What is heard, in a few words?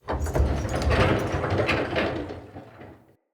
Archway Gate Door Open; UnderCity